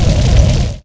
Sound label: burn; brainbread